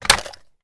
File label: reload empty fast mag out; gmod